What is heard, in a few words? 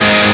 G#m; osu